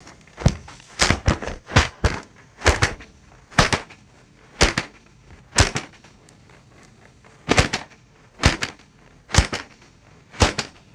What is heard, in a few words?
heavy rubber snapping and flapping